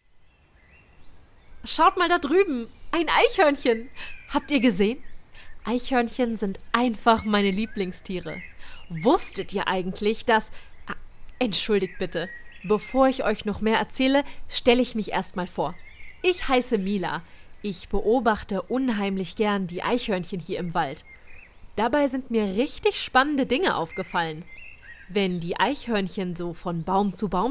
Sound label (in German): Waldlehrpfad Eichhoernchen